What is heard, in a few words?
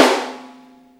Snares, Real